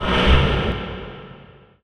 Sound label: portal usual; hell